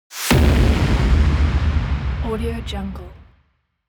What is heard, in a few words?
whoosh boom explosion; alexandersizonenko